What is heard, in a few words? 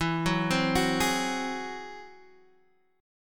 Ab Augmented 7th E, guitar